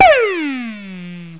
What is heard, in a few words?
Missed